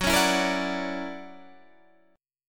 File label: Fsharp 7th Flat 13th, guitar chord